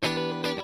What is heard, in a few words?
Strum